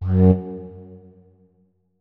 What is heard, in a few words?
flute notes; Hideout Furniture